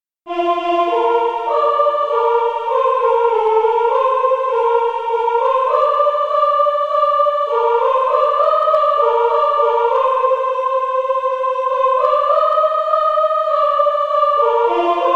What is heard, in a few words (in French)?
soprano